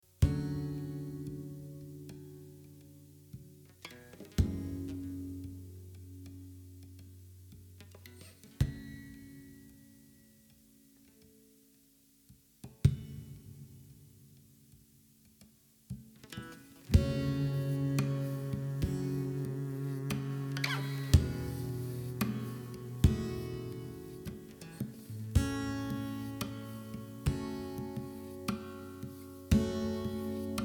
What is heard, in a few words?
worship intro